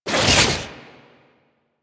Transform Claws Whoosh; Warframe; Weapons

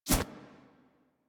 Melee Block Stop; Warframe; Weapons; Whooshes

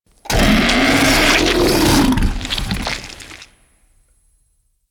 Ancient Tank Attack, Infested, Barks; Warframe

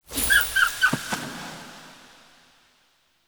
Fawn Mote; UI; Animal Motes

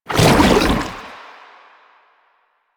Chem Strike Goo Layer, Foley; Enemies; Soldiers; Footsteps; Warframe; Lasrian; Nox

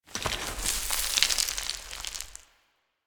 Tower Slate Slide; Warframe; Quests; TheNewWar; Ambience; Cetus; Unum Tower

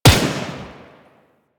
Tommy Gun Shot; Weapons; Warframe; Tenno

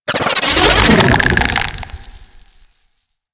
Shock Swarm Projectile Transform; Warframe; Infested; Shock Swarm Rifle

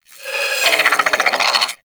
Sentient Attack, Barks; Warframe; Sentients; Brachiolyst

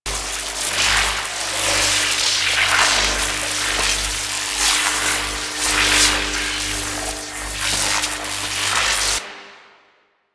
Void Shock Loop; Weapons; Warframe; Duviri; Incarnon Adapters

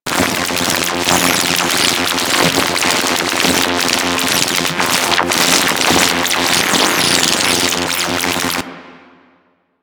Microwave Pistol Hit Effect Loop; Grineer

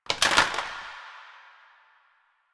Plastic Drop; Warframe; NineteenNinetyNine

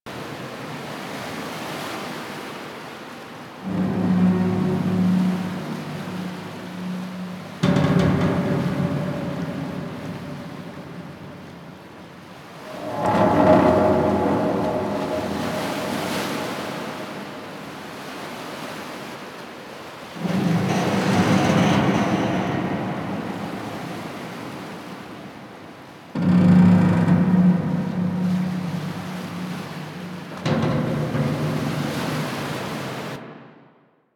Amb Loop; Warframe; Quests; Wraith Quest

